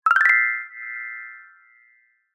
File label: notification alert prompt bright chime ping; zapsplat